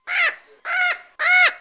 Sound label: Bird chirp (Mexican Red Parot)